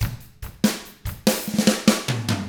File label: rock 6/8, drums; ILIO; Double Platinum Drums 1